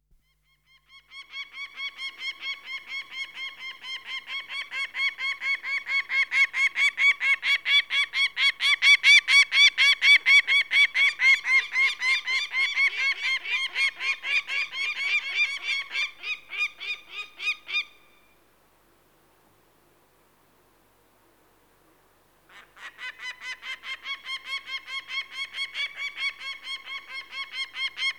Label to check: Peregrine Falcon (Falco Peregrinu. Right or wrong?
Right